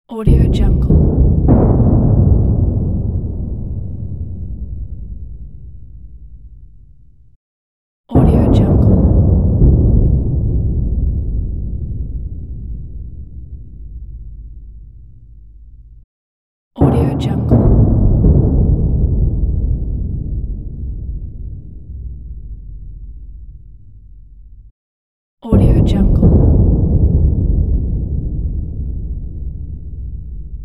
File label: battle drums